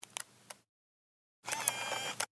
Digitalkamera

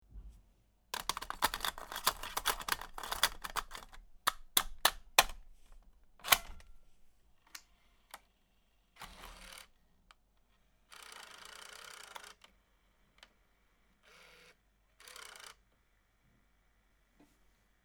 kamera Filmeinlegen

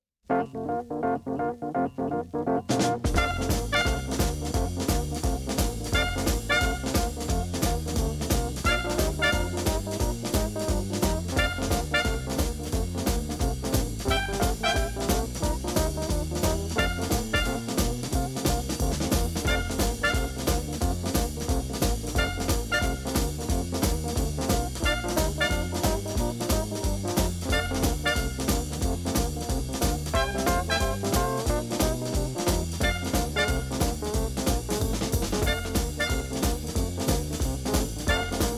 Stimmungen Boogie

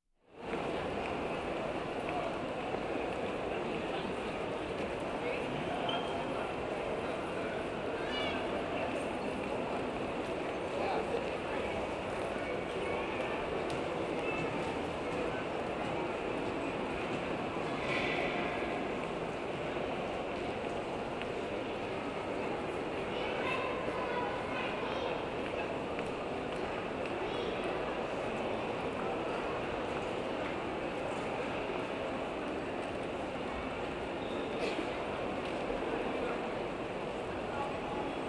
Grossstadtgeraeusche Ladenpassage

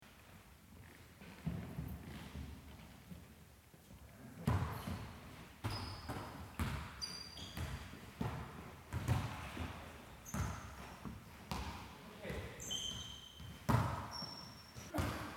Fußball Halle

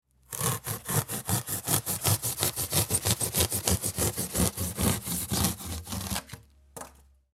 Styropor

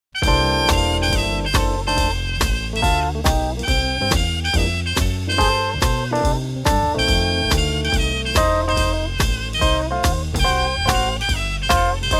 Musikeffekte Grossstadt

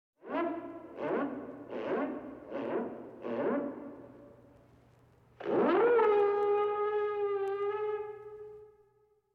Marine Schiffsalarm Unter Beschuss

